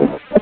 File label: beat hip